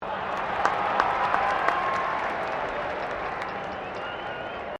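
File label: crowd 20K Melbourne Cricket Ground cheer low, Cricket at Melbourne Cricket Ground; Crowds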